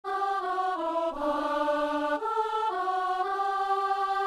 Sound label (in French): psaume, soprano; Psaume 127 (128); André Gouzes; Messe du 17 janvier à Saint-Denis